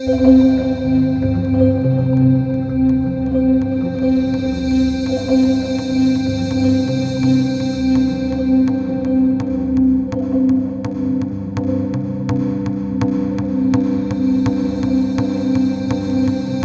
C4, Synth Line; 90 s Sample CDs; NiceBeats; SynthLine VOL-11

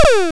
syndrum, Nasta Hit-Stix2

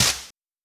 Snare, Fricke MFB 502

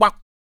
KUK, MALE PERC

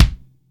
MIX KICK, TIGHT KICK

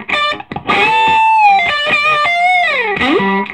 SOLO, Vintage Blues Guitar; USB Soundscan vol.22

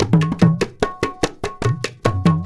India, Heart of Asia

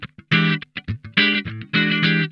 Funk Guitar; Zero G - Funk Guitar

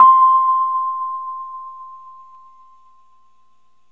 Rhodes fast C; 3D Sound; 3D Keys VOL-1

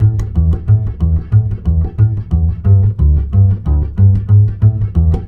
BASS, Balkanish Traditions; USB Soundscan vol.63; AKAI

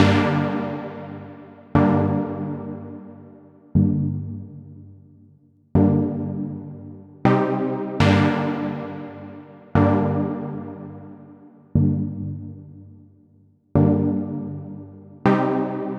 Deep Chords, Deep Acid; Sonic Foundry (Sony Creative Software); Afterhours EDM

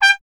BRS TP G 0, Trumpet; Miroslav Vitous

